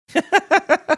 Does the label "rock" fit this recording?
No